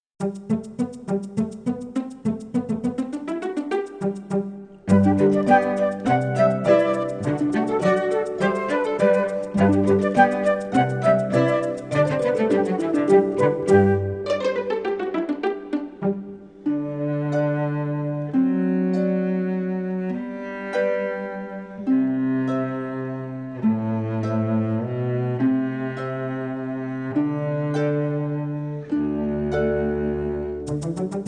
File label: Tiptoe Fast And Slow